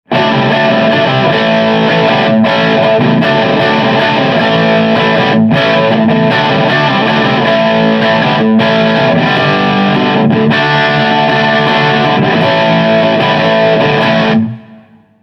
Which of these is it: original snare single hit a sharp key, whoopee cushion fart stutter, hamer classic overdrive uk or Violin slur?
hamer classic overdrive uk